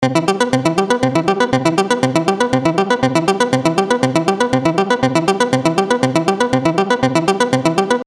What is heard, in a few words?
With Arp